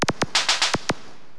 Zappel Zip

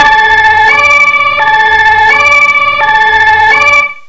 POLICE; psion cd vol1

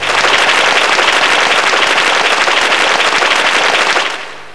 APPLAUSE; psion cd vol1

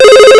PHONE; psion cd vol1

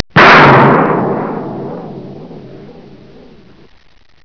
BUM

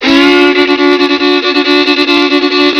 Fun Violin; psion cd vol1

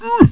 UUUH; psion cd vol1

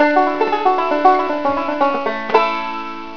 BANJO

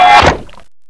ZAP

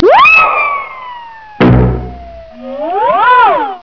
Zing Ping Plang; psion cd vol1

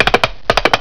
Toddle Trapp; psion cd vol1

